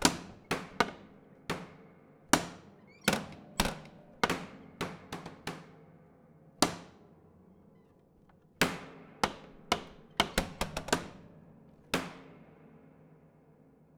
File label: Panels Slap Dense Ped, Piano; Piano Destruction